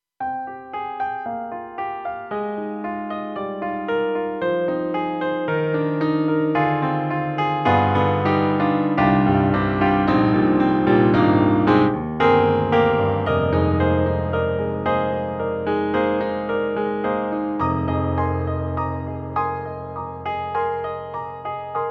Dynamic Grand Piano; KORG M3 XPanded Program

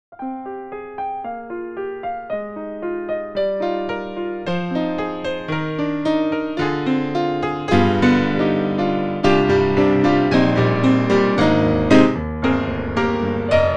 E-MU EmulatorX Studio Grand

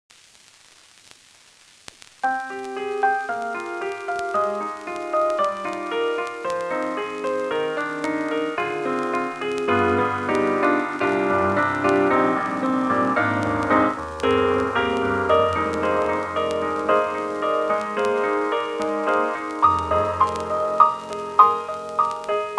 LoFi Ac. Piano; KORG M3 XPanded Program

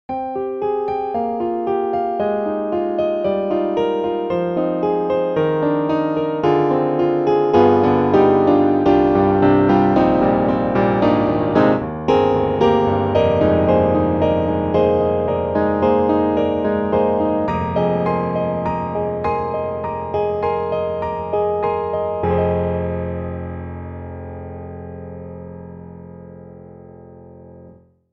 Pianoteq Grand C1 bridge; MODARTT S.A.S.

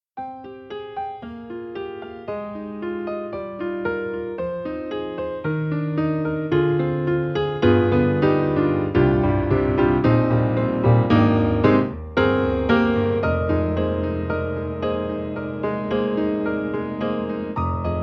Sustaining Grand Dry; Orchestral Tools; The Orchestral Grands

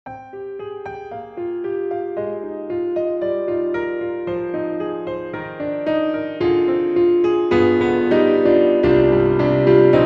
Grand Pianos, German D, Chapel Concert D; Synthogy; IvoryII

